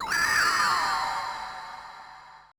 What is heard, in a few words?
AMBI FX